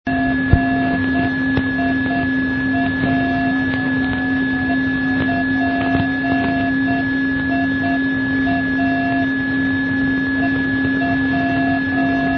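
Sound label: non-directional beacon; FER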